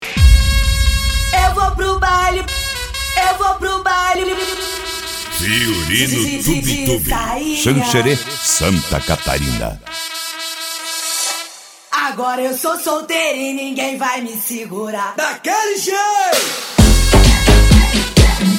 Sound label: retro